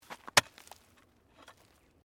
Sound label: Digging Pick Hard Earth; Various digging tools and processes; Construction